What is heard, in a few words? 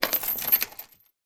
household door chain movement internal; zapsplat